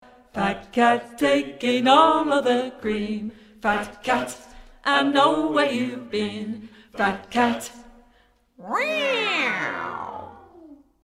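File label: Tenor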